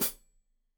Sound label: Hat Foot, Hi-Hat, Cymbals Stereo